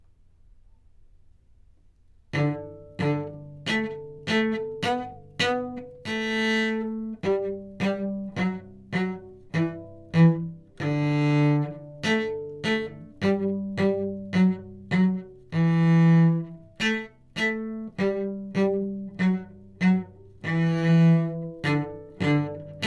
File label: Twinkle Final Cello